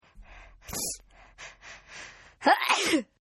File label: Sneeze Female; Sneezing; Human